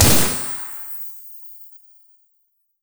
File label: bowmaster hawkshot; LoL; ashe